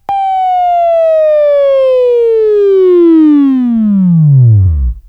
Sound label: Buzz